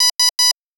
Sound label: Beep, Buzz, Clean, Tight, Mid, x3